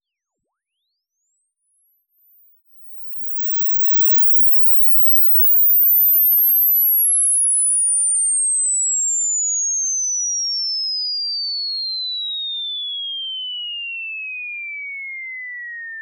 invsweep

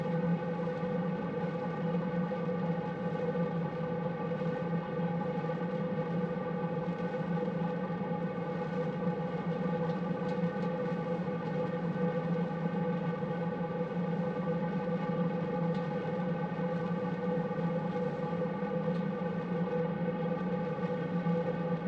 burst 16ms; CESI; LaSpezia; Mic3-4

